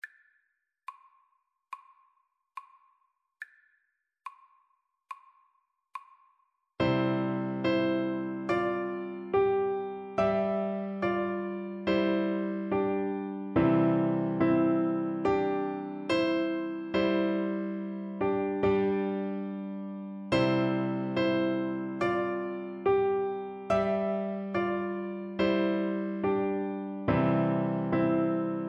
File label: beethoven ode to joy, oboe